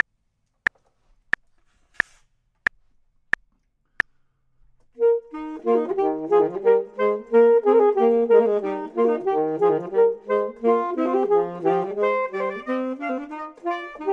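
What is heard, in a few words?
both, Saxophone and Clarinet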